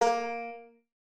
Banjo Note; Bendy: Lone Wolf

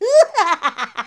clown laugh; Ringling Bros. and Barnum & Bailey; Wii

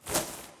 Vase Angelwings Flap

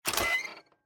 Mailbox Open

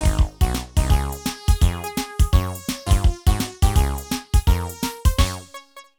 Jingle Level Start; Sunday Lawn

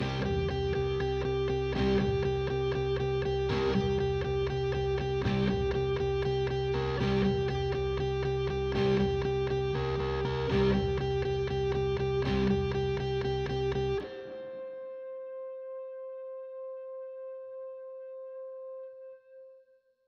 Combat Threat 120 Cm; Combat E- Tower3 Threat3 120 Cm 78 3

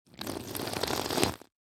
Drink

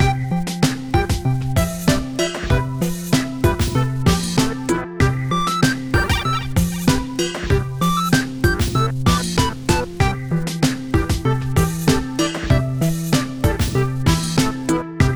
Results Victory; h3h3 Ball Rider; Android

